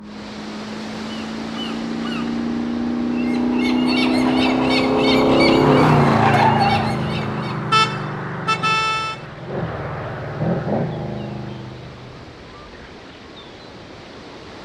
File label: Daniel Races By gulls